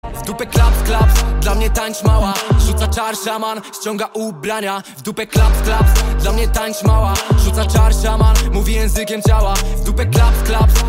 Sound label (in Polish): Klaps Klaps